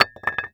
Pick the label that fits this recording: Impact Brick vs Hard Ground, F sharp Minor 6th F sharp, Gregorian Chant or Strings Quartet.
Impact Brick vs Hard Ground